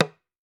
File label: TAM TAM, African Drums